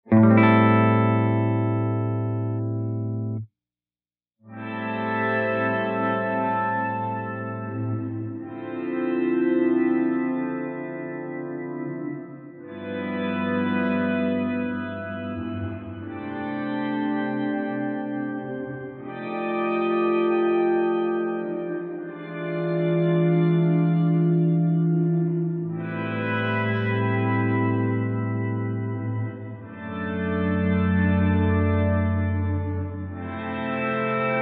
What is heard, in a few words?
Ambient Swell Full Wet, reverb